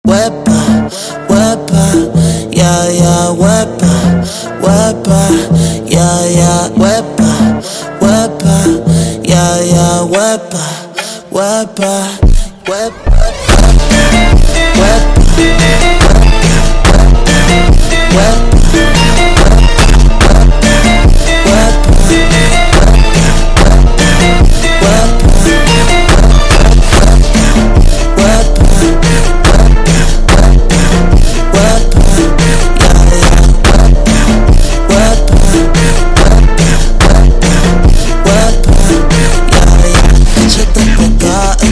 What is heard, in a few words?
Funk Super Slowed